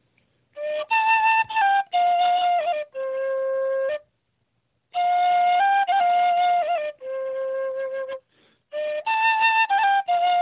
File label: zen flute